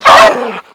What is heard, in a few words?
dog pain